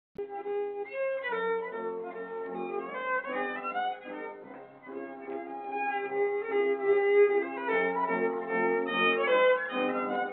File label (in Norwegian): MUSIKK; amerikanorsk; einar haugen